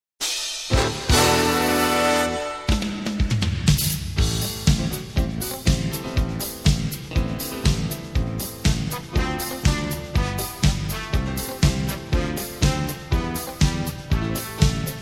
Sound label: instrumental; Hokuto no Ken; silent survivor